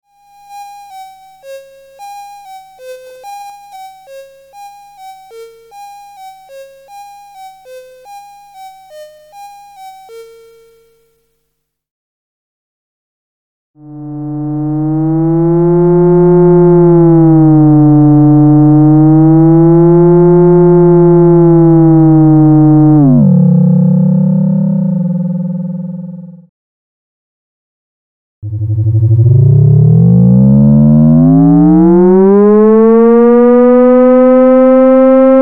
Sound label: EMS Logik